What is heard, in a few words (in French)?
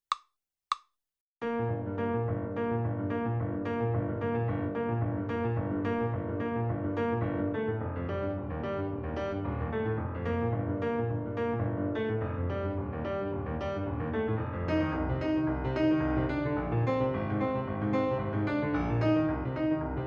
boule de neige accompagnement